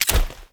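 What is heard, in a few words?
UI select item; Veilbreaker; Kahl Customization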